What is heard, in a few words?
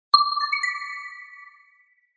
notification tone